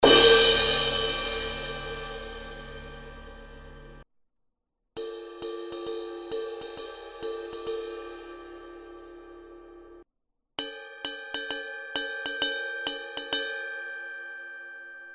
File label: zildjian 18 inch k custom session crash crash ride bell